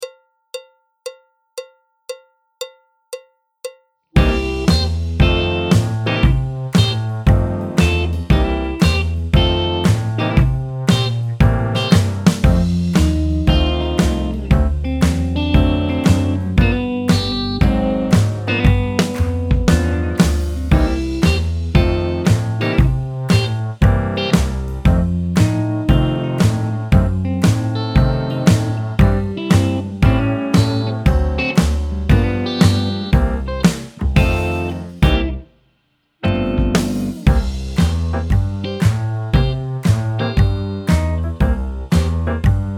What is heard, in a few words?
Tour de Fours BT (piano); Jazz Syllabus Level 3